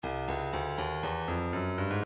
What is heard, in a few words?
breakbeat midi files 120 121 bpm 120 dirty house; Stylus Breakbeat Midi Files